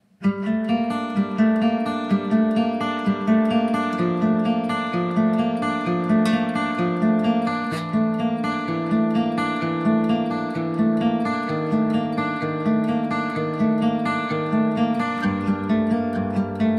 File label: music gtr